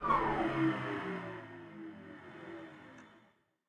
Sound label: Hemopump Working Stop; rimworld